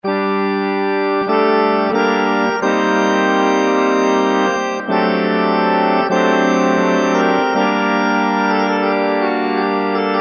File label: Cathedral Pipes; Kurzweil K2000; Kurzweil K2000 Value Pack; Extra Programs